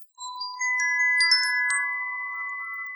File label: denoising glock 0dB mmse